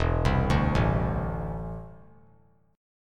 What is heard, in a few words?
E 7th Suspended 4th Sharp 5th; E7sus4#5 arpeggiated; JGuitar